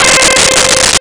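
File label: Over Drive Guitar C5; Nintendo; July 2020 Gigaleak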